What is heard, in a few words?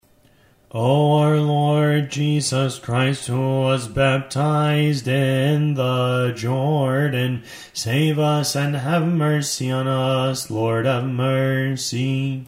concluding canon, hymns